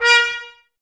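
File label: Brass trumpets A#, Mute; Miroslav Vitous - Woodwind Brass Ensemble; Tps Mute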